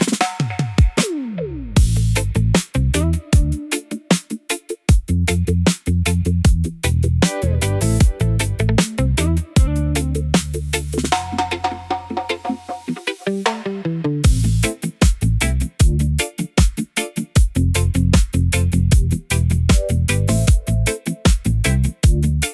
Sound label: Guitar